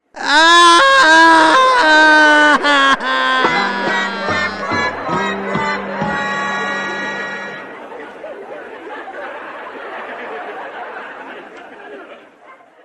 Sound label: ultra epic fail